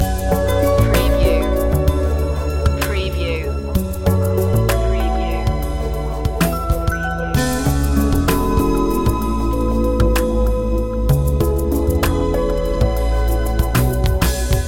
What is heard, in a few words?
loop, chill